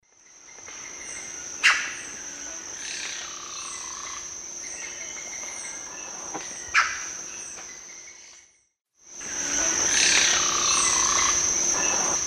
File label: Cacicus haemorrhous, calls and song; S. America; Eastern Lowlands